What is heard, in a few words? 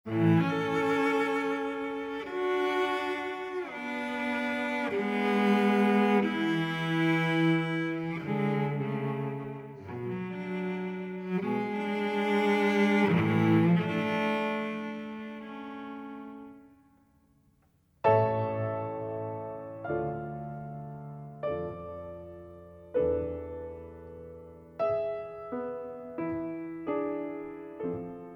Classical; Beethoven